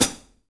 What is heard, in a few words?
Pop Pedal HiHat